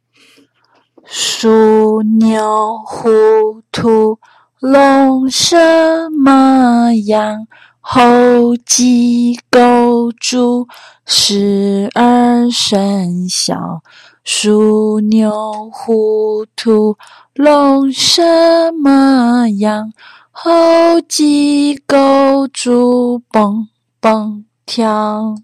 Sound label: 12 zodiac animals song